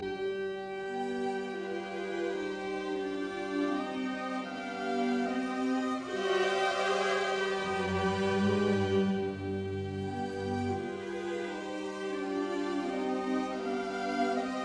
Piano Concerto in E flat major Andantino; Mozart; Jeunehomme

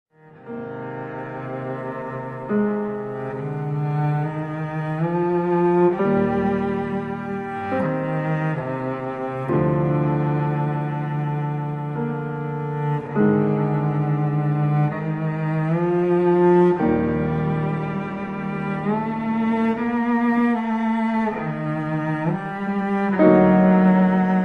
Cello Sonata for cello piano Adagio Presto Adagio; Barber; Op. 6

